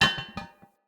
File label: Incense Burner Contact Impact